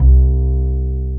BASS, Drum & Bass, 140 BPM; Ueberschall; Drum & Bass Flash Trackz

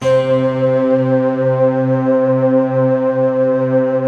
HARPS, Harpsiflute, Harpsi Stack

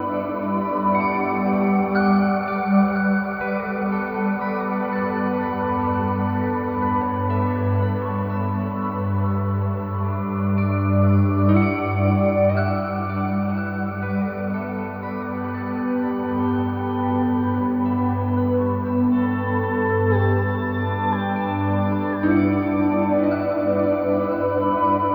Heavenly Plateau, Guitartronics; Sonic Foundry (Sony Creative Software); Ma Ja Le Ethereal Textures

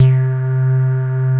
CS-15; Masterbits; Vintage Voltage; LEADS

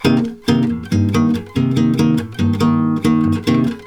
C MIN, Brazil Bossa; USB Soundscan; AKAI

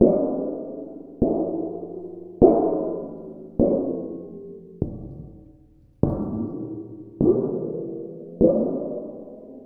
Steel Sheet Bent Mallet; Sonic Foundry (Sony Creative Software) - Ambient Atmospheres & Rhythms; Ambient-Metals

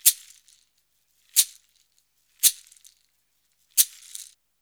Modern Percussion Loops; Soundscan vol.56; AKAI

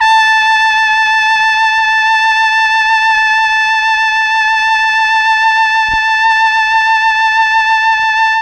A3 trumpet, Mellotron; CHMB TRUMPET; Mike Pinder - Mellotron Archive CD

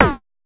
Syndrum Lo; Pollard Syndrum-178; Pollard Tom 3d Lo

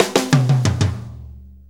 6-8 Fill, Drum Tools

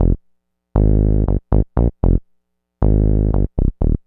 Funk Bass in F, Synth Bass Loops; Sonic Foundry (Sony Creative Software); Groove Elements

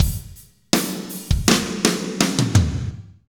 Drumscapes Roland, Pwr Ballad; Northstar

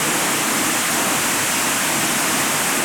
Ice Cave, On The Glacier

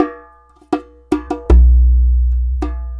Klong Ya, Worldly Rhythms; Sonic Foundry (Sony Creative Software); Ma Ja Le Ethereal Textures

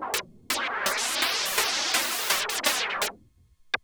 Juno-60 Arp 125BPM

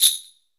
SUZU

